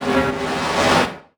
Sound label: Transition Hit Impact Distorted; Production Element